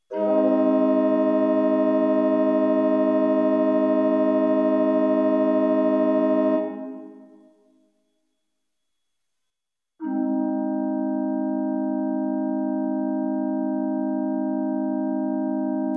Open and Stop Diaps A flat chord close posn 4th Comma